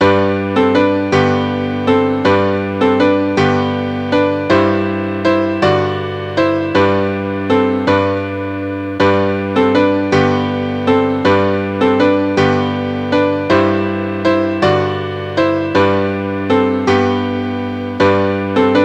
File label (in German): pastorale klavier